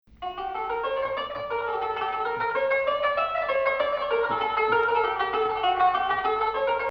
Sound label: Hijaz Octave